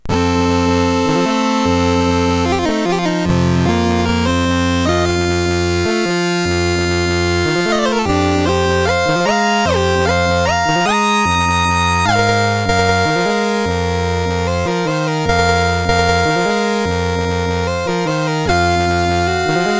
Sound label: Chips, MOS6581R3; A Brown